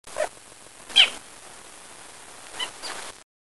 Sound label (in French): cri juvenile